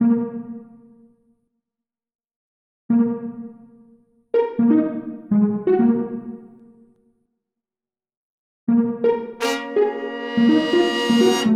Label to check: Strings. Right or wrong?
Right